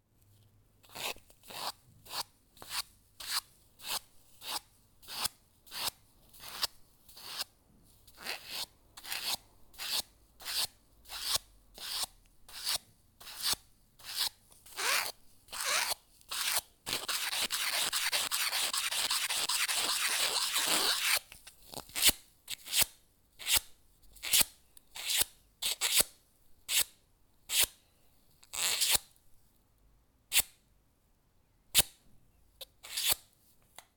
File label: Sand Paper